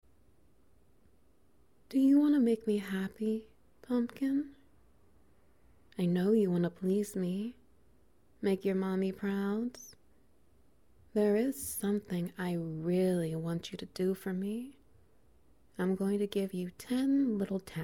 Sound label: Wet Noises; Countdown